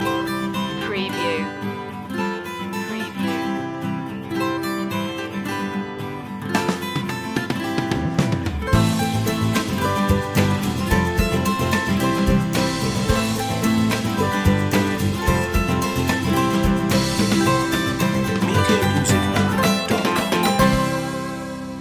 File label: simple happiness loop